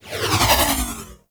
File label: Ball Projectile By; Warframe; Tenno Ball; Missions